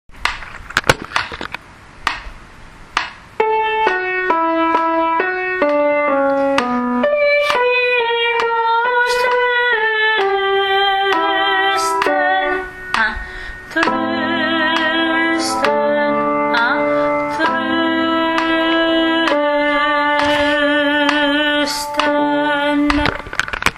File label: soprano; Brahms requiem allemand; prise de note 2